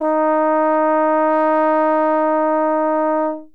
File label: argh, Horns